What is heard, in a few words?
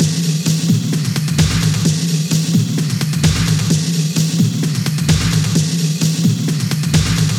130BPM Kit; VFH1 130BPM Remnant Kit